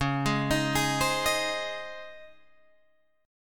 C sharp Major 7th Suspended 2nd C sharp, Guitar Drop D